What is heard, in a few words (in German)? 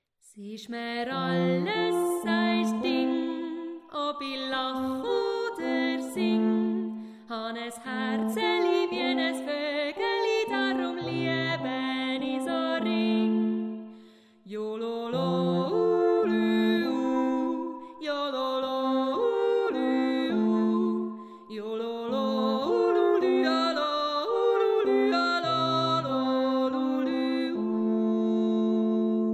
alphorn gesang